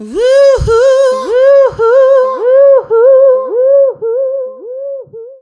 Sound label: HOUHOU, ADLIB WOM, Funky Vocals; USB Soundscan vol.18